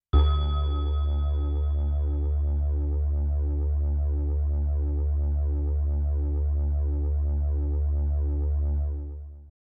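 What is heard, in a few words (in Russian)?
звук похожий на звон издаваемый на синтезаторе нота D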